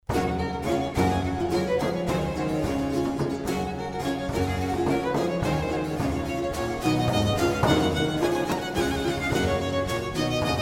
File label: Flauta; Anonymus Pastoreta Ychepe Flauta: II. Allegro; arcantus Musikproduktion; El Galeón